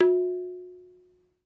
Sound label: Na, Tabla; ETHNIC and WORLD PERCUSSION; Tabla Kit Large